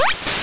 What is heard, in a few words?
Water Gun; Pokemon; Gen 2; attack moves; GSC